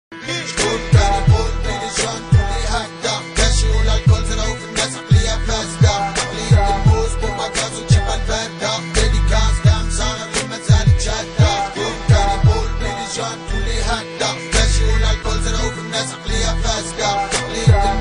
metal

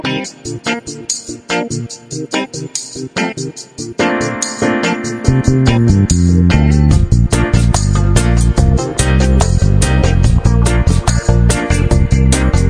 summer reggae tune

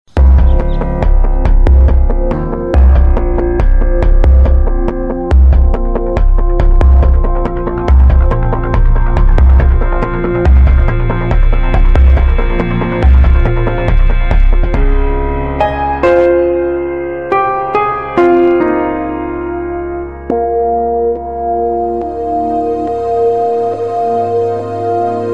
mercedes e63 amg

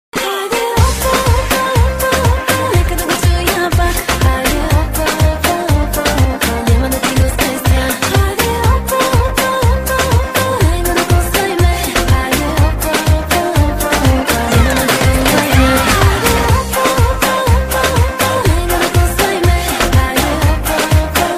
bulgarian dance mix

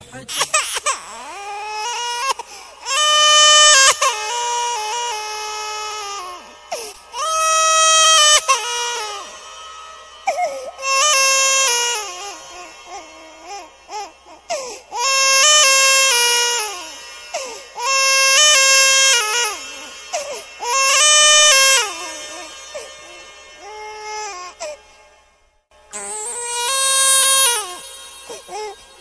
sweet child crying

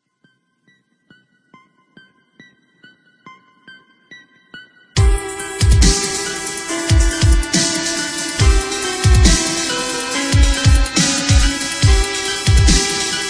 dubstep remix

